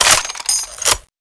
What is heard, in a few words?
pkm box in; paranoia